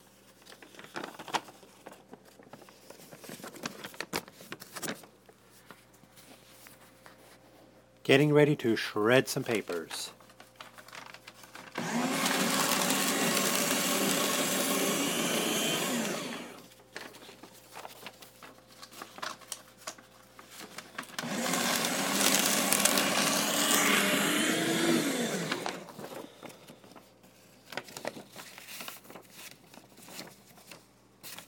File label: shredding paper